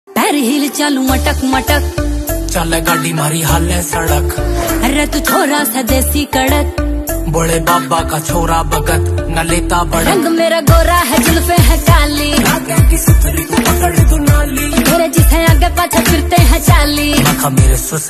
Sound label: haryanvi ringtone